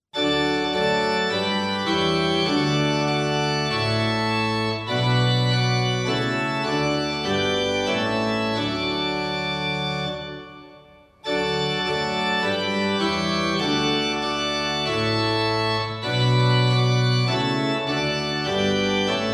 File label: Hymn, Ratisbon; Christ whose Glory fills the Skies; Hymnal 1982